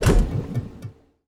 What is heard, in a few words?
vivarium empty; Stellaris; grand archive